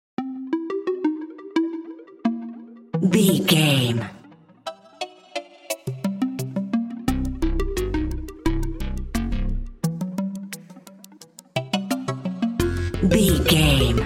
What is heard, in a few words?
plucky curiosity